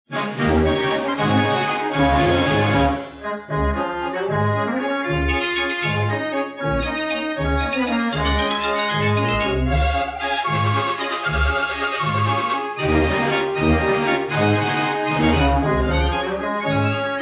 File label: Belgian Band Organ